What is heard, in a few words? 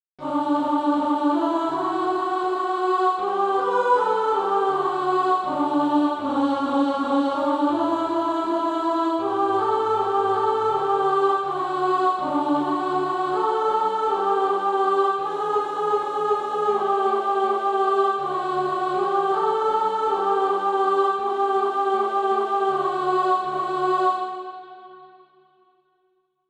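alto, Practise Music; Freely Freely Freely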